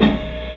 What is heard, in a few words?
Loop, Chamberlin Rhythmate